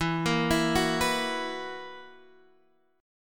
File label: B Major 7th Suspended 4th, guitar chord